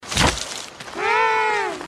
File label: penguin falls down